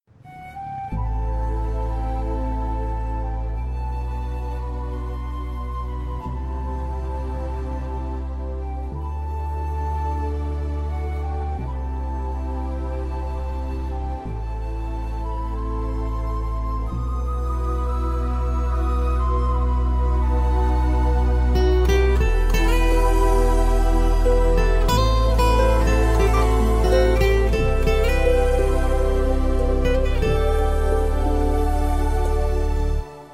PAIN OF LOVE FAILURE BGM RINGTONE

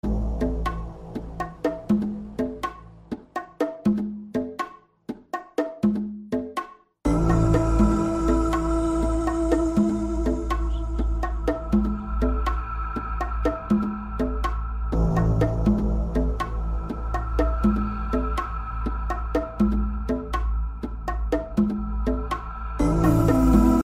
romantic Arabic iPhone 8 ringtone

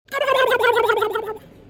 turkey gobble ringtone

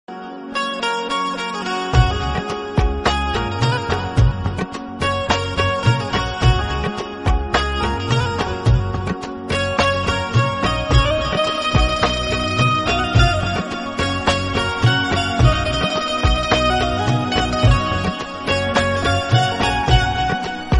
INSTRUMENTAL RINGTONE

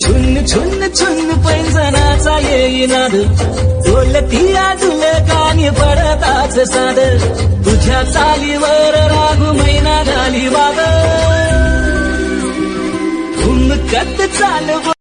SONGS RINGTONE

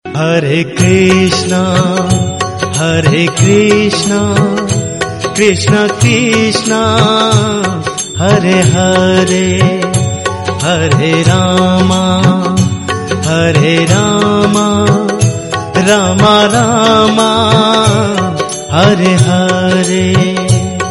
HARE KRISHNA RINGTONE

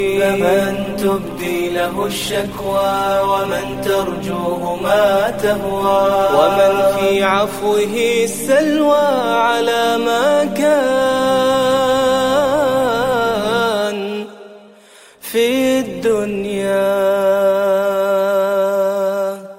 Islamic Tone Ringtone

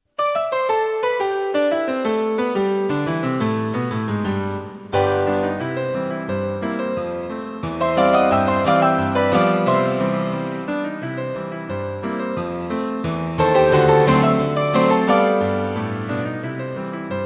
MUSIC RINGTONE

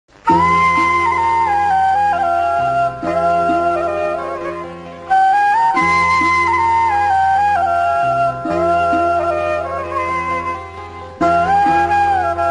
LOVE FAILURE BGM RINGTONE

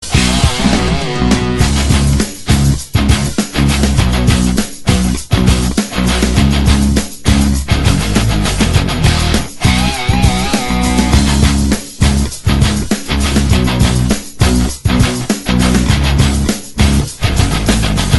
instrumental ringtone

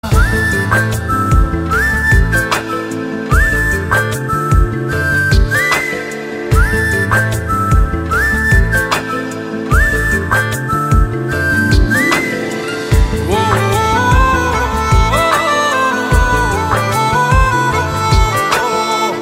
FLUTE RINGTONE

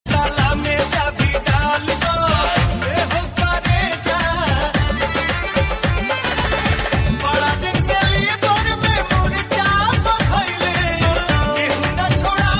BHOJPURI RINGTONE

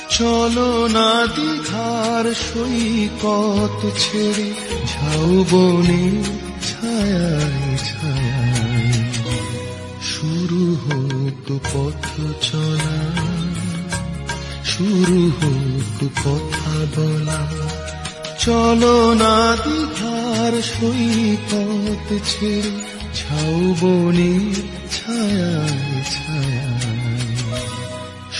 Bengali ringtone

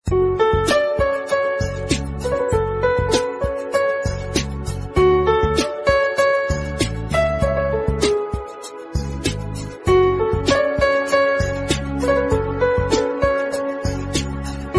INSTRUMENTAL RINGTONE

